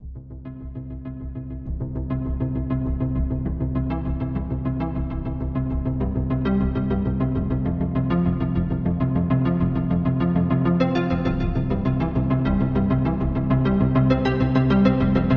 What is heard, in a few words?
Celtic Synth; KORG OASYS; Celtic Synth 2; oasys assault